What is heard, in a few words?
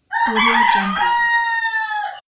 rooster screams